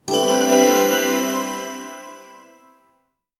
win slot, slot machine

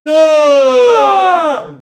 defeat in the game

